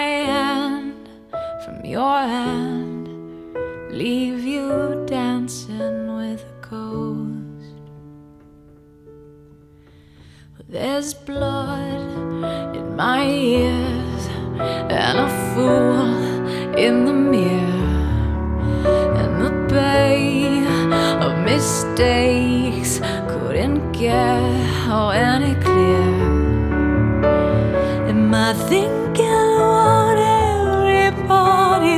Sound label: Slow Disco Piano Version